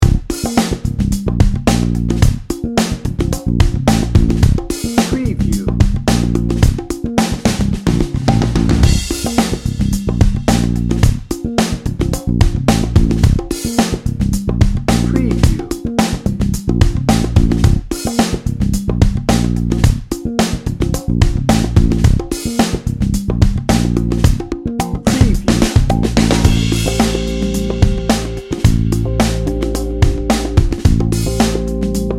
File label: funky feet dnb